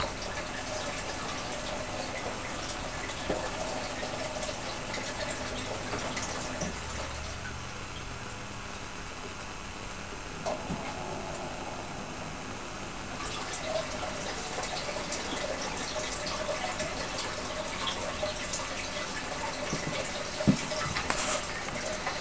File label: office noise